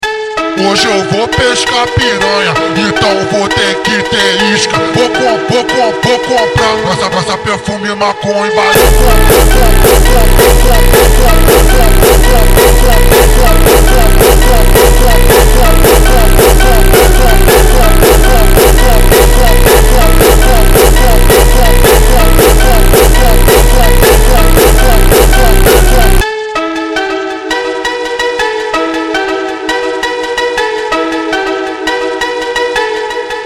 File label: hardstyle